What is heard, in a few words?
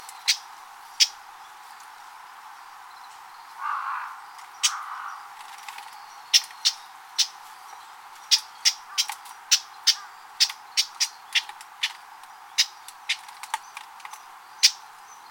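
uguisu, Japanese bush warbler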